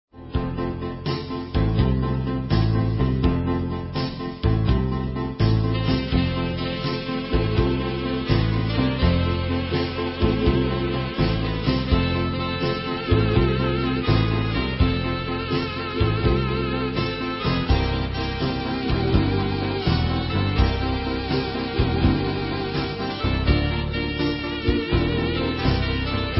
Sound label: sax